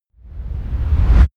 promo pad

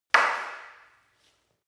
staff wood fighting single

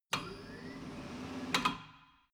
tire changing machine turning platform latch

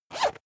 zipper use fast

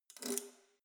letterbox metal key pull out

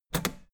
old button harsh push hard

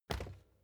bicycle street foot pedal

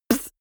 beatbox snare punchy short

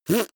beatbox effect suck in

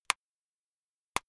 plastic pressured single

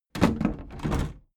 big plastic case lid put down regular

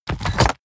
mechanical lever stone small down

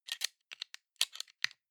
plastic frame small mechanics rattle

